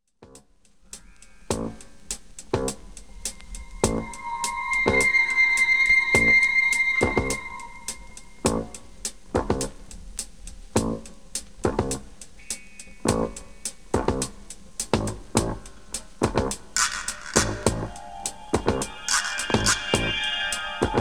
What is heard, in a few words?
GONG